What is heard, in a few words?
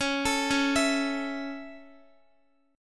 Db Minor Sharp 5th Guitar; arpeggiated; x,x,11,14,13,11; 4E,4A,5D,5G,5C,6F; Dbm#5